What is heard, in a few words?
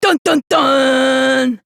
dun dun duuun; kurokuma